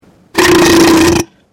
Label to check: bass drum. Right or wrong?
Wrong